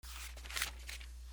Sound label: PAPER